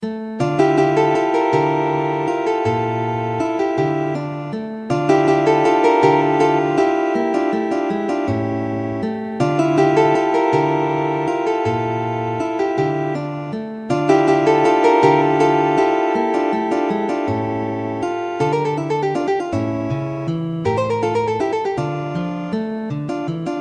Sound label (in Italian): valses, guitar